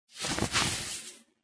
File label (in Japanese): 服の擦れ; 生活; 音人